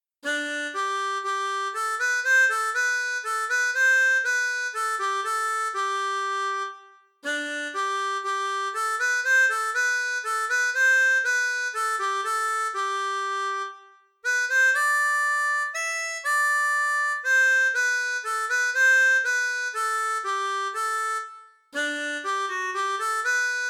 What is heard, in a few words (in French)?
Hymne Pays Bas, harmonica; Hymnes